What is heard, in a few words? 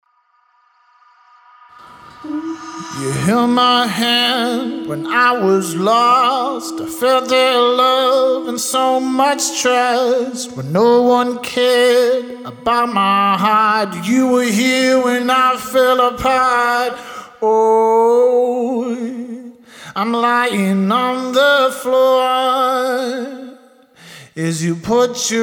acapella; 128.0 BPM